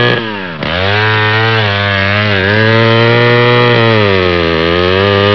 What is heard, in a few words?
Band Saw